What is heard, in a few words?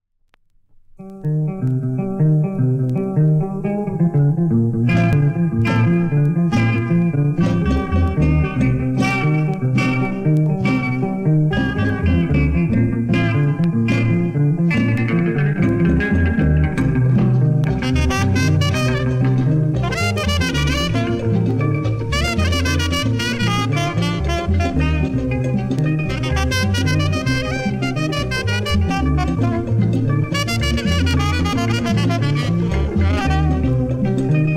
Mali, 1970s